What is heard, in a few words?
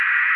radio random, prison; hl2